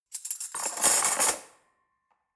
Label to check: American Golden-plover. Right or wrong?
Wrong